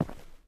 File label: ROCK, steps; Terrarum